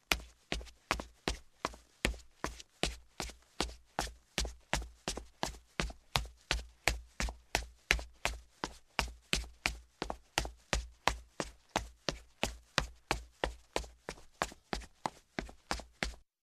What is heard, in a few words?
sneakers fast wooden steps